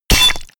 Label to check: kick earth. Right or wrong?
Wrong